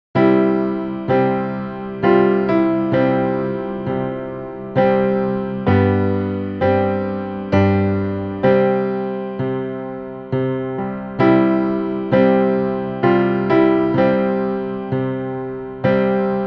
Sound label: Melody with Explicit Beat